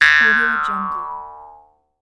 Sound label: jaw harp